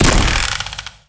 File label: big bow